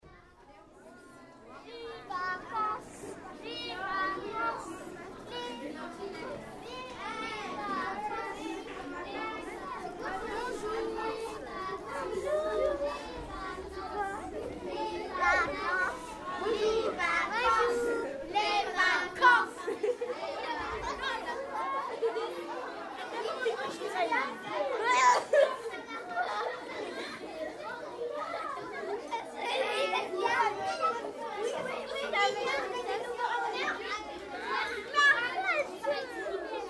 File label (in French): tutti soli